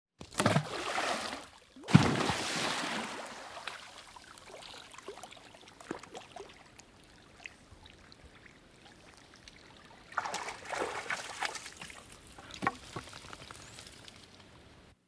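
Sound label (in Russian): собака достает из воды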